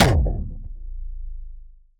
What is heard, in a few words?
death